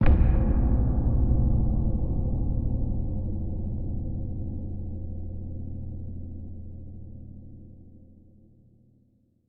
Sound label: Music boss Intro Impact; Music boss 2 - Intro Impact